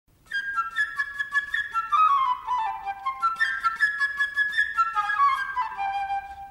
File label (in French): flute oiseau